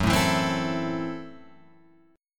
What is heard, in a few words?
Gb Minor 7th Flat 5th Gb down Guitar Open F; Gbm7b5 strummed; JGuitar